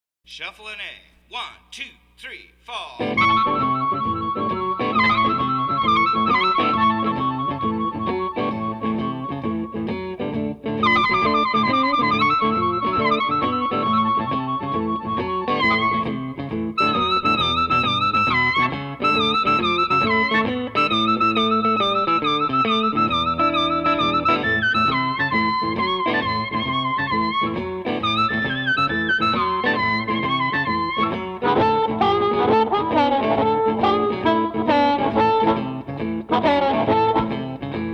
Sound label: A Shuffle with Harp; harmonica; Blues Harmonica Masterclass